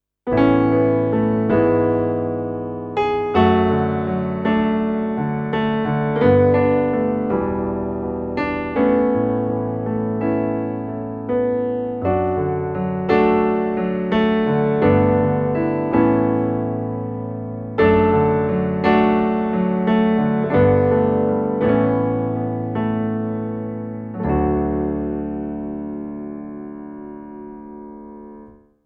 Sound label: Korg Pa5X Japanese Grand Piano